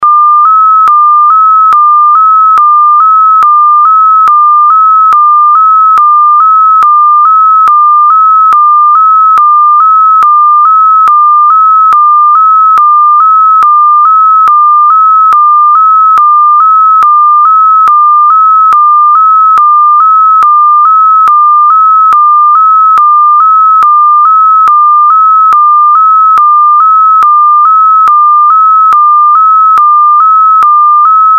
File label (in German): Suchton im Fangfall; telekom scheidt